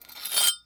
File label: Sword Scrape Cinder Block, weapons; AdobeCC